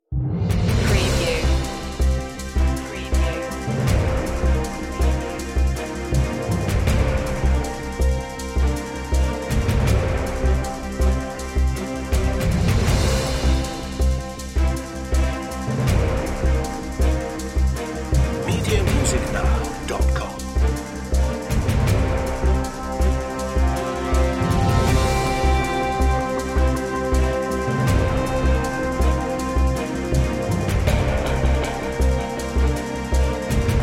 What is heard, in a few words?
informative news